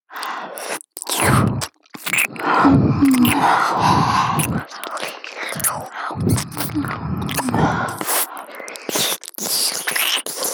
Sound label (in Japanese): 両耳舐め, ASMR; SE有り; 白衣の天使